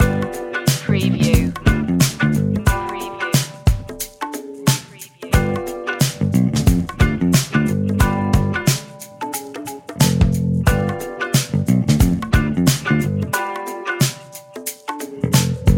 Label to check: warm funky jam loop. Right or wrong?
Right